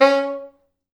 C#3, Pop Brass; Best Service ProSamples vol.19; AKAI

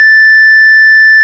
Farfisa; Farfisa Clar DBar

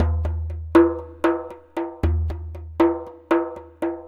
Copper Dumbek, Ethnic Percussion; Sonic Foundry (Sony Creative Software); Ambient Atmospheres & Rhythms

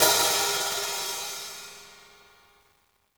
hihat - opened; JazzFunkKit

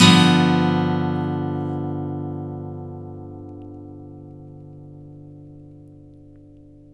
Blues Guitar, BARI CHORDS; ILIO; Fretworks

